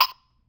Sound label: Disco Drum HH, drum machine; Funky Fever Disco Drum Sample Pack; 300 Drum Machines